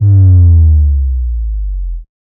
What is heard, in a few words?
808 slide; Sonic Dope